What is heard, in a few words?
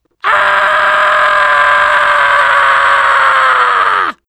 spy scream